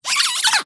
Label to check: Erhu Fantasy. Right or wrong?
Wrong